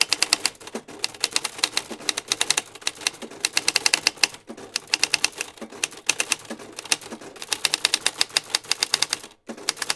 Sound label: TYPING, TYPEWRITER